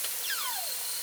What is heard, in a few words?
DWN WARBLE, beluga